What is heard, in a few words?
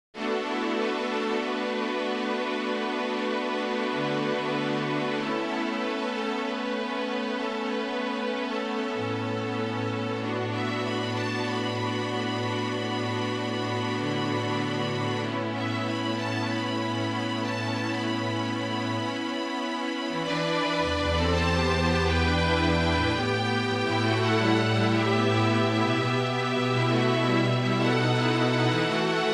Fragile Quartet; pop